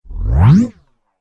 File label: Laser Riser Whoosh